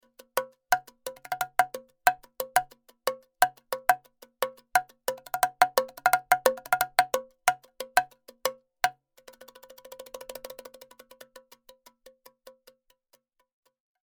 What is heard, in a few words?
Bongos EQ